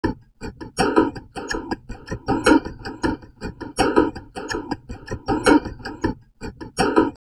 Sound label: VOCOD, Drum Loops Crazy Processed